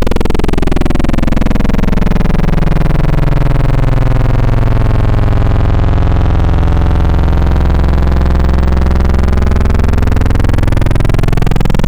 Moog Gone Digital, Sub 37; Synthetic's Serum Collection; EvoSounds

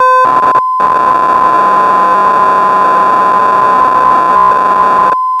RINGTONE

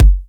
noise kick; Synthetic's Serum Collection; StiickzZ - Sticky Future Bass Vol. 2

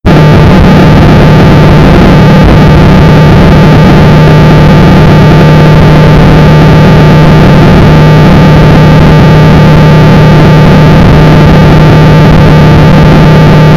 Plane Noise; lolicore

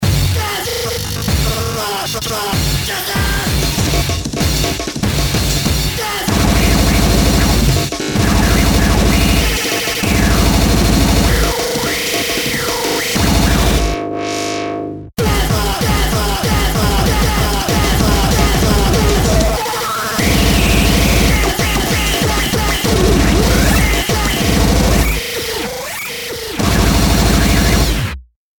dance floor; lolicore

